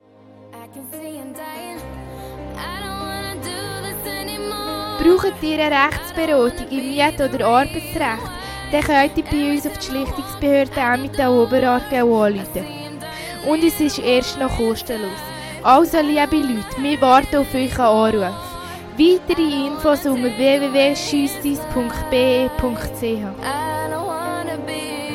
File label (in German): Jingle; Schlichtungsbehörde EO